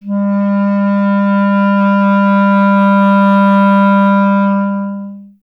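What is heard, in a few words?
wind clarinet G; Miroslav Vitous; Solo Instruments VOL-1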